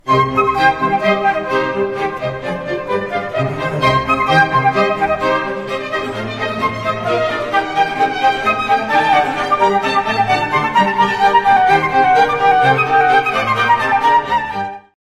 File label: Huawei Nova 11 Ultra, ringtone